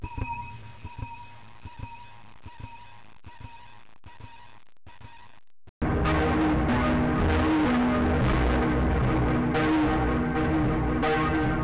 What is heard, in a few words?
aeolian harp